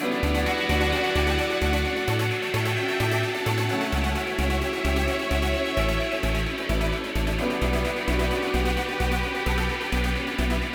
ELECTRO ROCK SYNTH

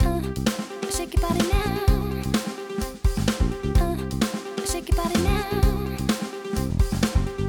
compressor smack attack off 4 tracks

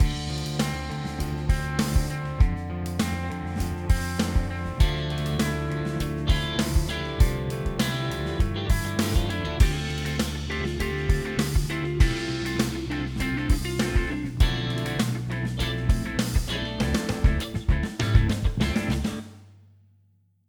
MIX TECHNIQUE SMACK ATTACK FULL KIT OFF

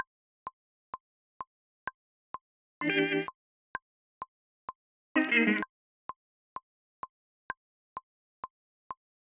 REVERB OFF GUITAR ONLY; replika

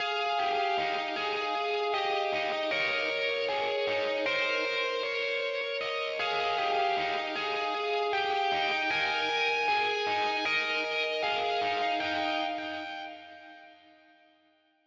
LOUD ROCK ADDITIONAL GUITAR

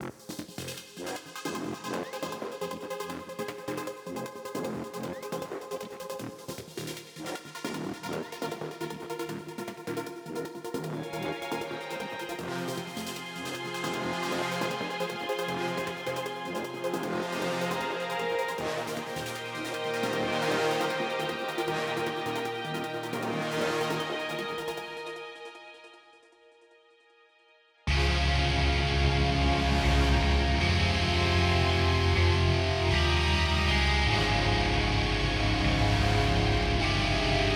LOUD ROCK SONG RHYTHM OFF

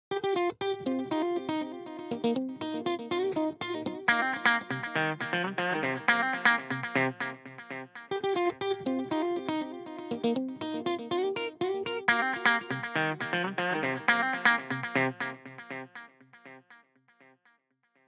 stereo delay sample

